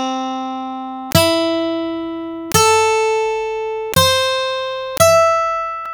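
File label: acoustic guitar; CMI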